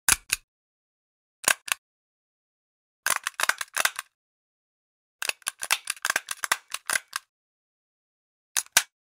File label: staple